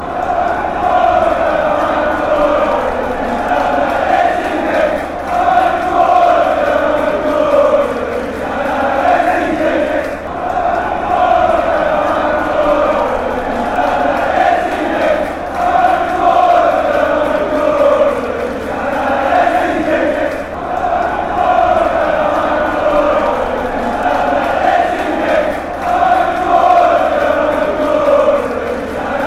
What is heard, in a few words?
chant; genk